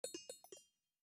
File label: Footstep Stinger; StyxMech